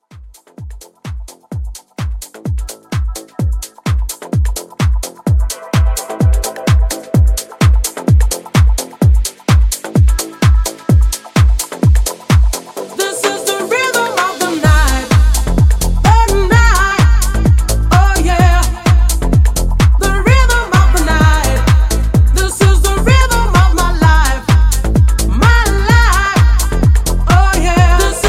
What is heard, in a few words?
House Vocal Edit 128